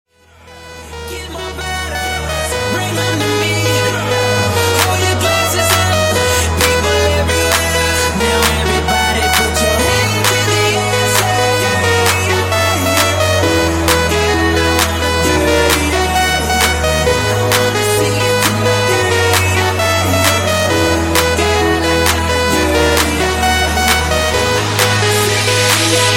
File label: Mashup, 132 Bpm